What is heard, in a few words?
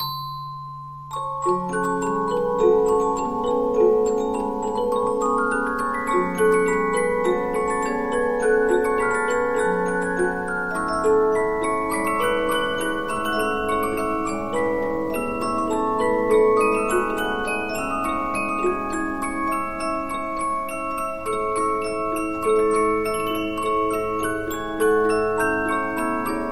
Polyphon, 15inch disc musicbox